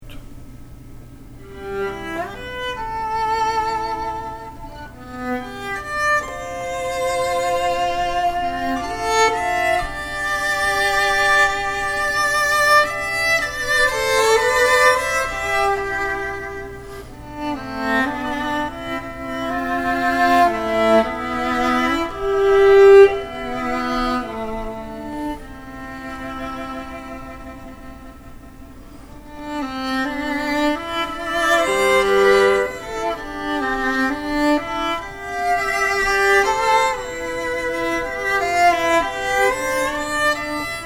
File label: Duo violin; Classical